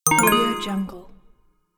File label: get coin